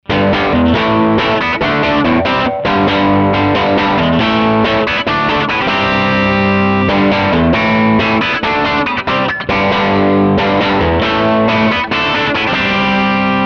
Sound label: gibson sg rock